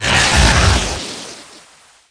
draco hit; DAoC; Toa; Gamestar

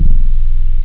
synth bass; da capo vol1; instruments

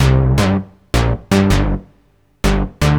SNTHBASS D+B 160 C, Drum Bass, Bass

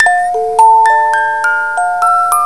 Music box, short; Curse of Dragor

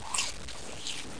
Chew

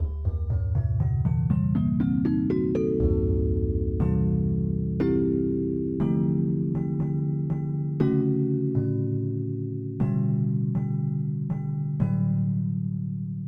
Celesta; EverQuest

